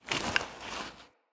Acorn Plant, Objects

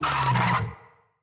Infested Big Ashen Vocal Pain; Enemies

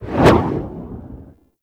Ninja Smoke Screen Cast; Warframe

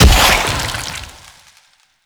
Bullet Jump Toxin; Warframe; Mods; Parkour

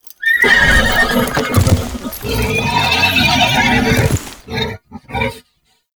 Horse Idle Three; Warframe; Duviri Horse; Vehicles

